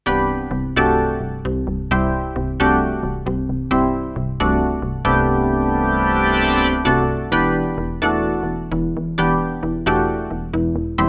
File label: Orga Bells; Minimax; dinopark